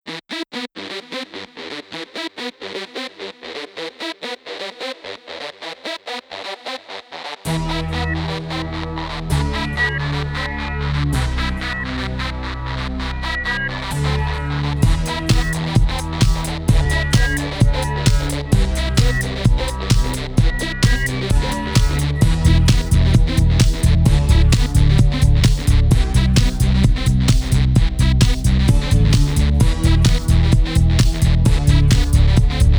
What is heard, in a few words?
techno trip 432 hz